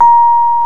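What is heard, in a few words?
piano Rhodes Mk.V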